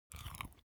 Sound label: human mouth soft crunchy bite; smartsoundfx